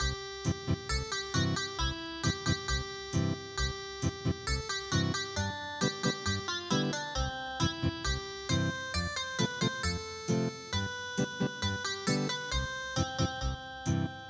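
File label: unplugged Ethnic Guitar; midi; traditional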